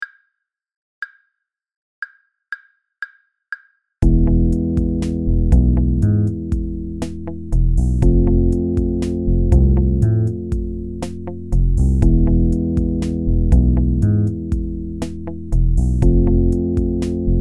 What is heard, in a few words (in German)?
Phrygisch E Dur Play Along